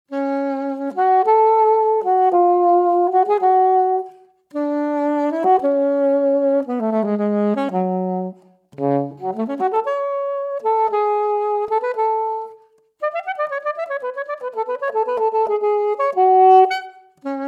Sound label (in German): Klassik Selmer AXOS solo